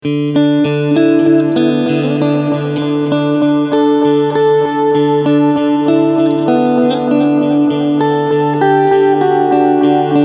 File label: Medium Delay Mod ON